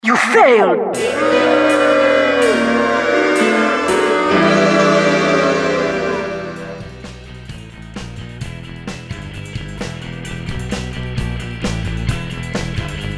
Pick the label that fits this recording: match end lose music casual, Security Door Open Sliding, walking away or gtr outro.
match end lose music casual